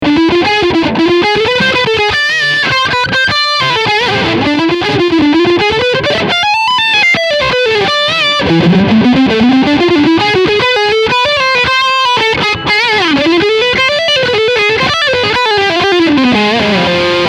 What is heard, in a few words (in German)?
Leadsound Steg HB